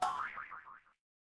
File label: cartoon boing